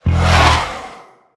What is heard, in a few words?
chariot fly; smite; Apollo